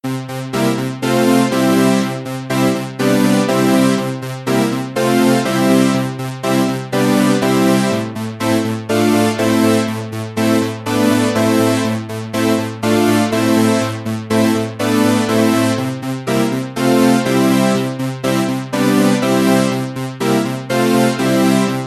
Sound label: 80s synth ringtone